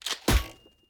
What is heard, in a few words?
Medieval Tower; Rabbids Multiverse